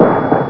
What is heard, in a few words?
BAM